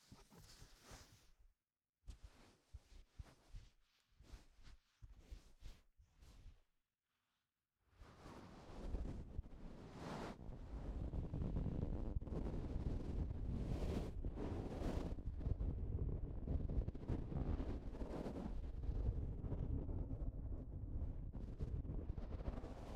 タオルを使って耳マッサージ, ASMR; メイドコスで色々な音; リラックスASMR

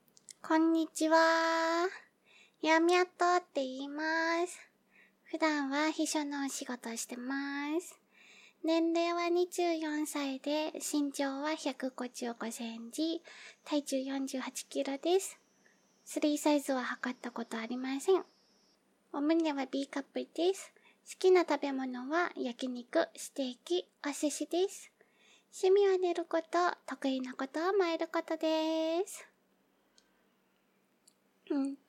おしっこ実演 薄手のパンツを履いたままおしっこ

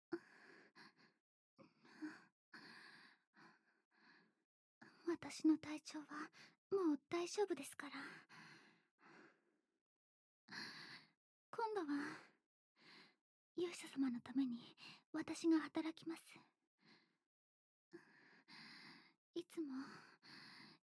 エルフのむっつり耳舐め手こき; 攻めに不慣れなエルフ

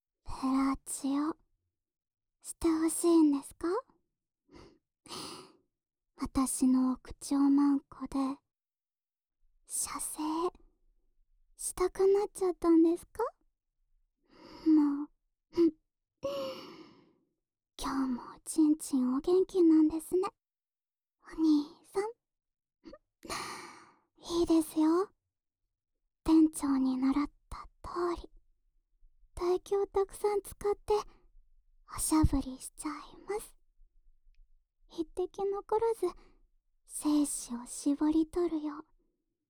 濃厚 フェラチオ ループ; 耳かきリフレ『春乃撫子』

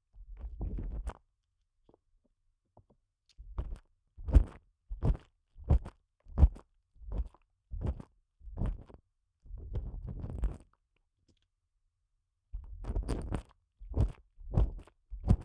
ステンレス 耳かき, 音だけ たっぷり (声なし); 【安眠的17小时ASMR】为现在就想睡觉的你哄睡的治愈少女。让大脑放空的全套安眠(现实掏耳・按摩・耳语)

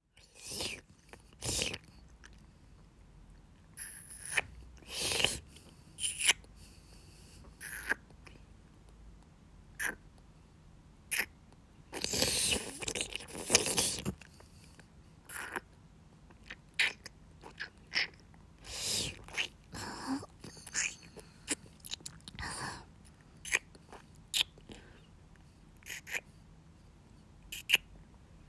キス; マゾハーレム

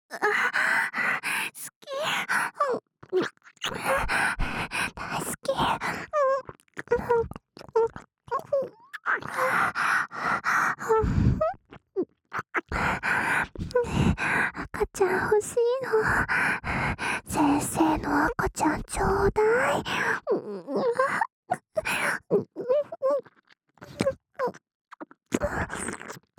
喘ぎ好き好き耳舐め(NR済・SEなし); エロかわJKと子作りえっち♪

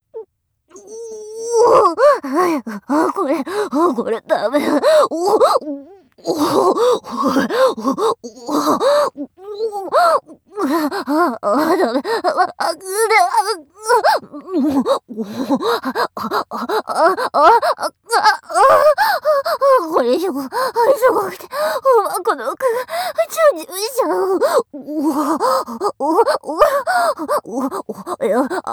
喘ぎ声C(下品); 清楚ビッチエルフ姫のメガ盛りマントロフルコース

